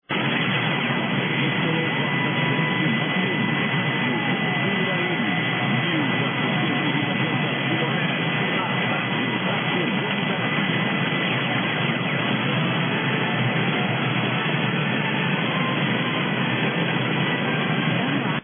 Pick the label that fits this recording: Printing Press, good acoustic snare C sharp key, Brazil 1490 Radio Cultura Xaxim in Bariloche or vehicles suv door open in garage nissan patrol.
Brazil 1490 Radio Cultura Xaxim in Bariloche